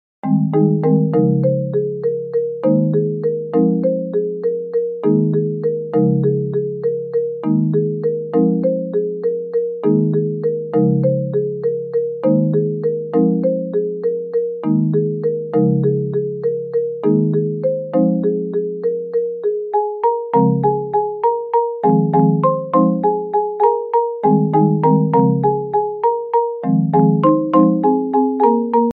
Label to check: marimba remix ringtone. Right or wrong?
Right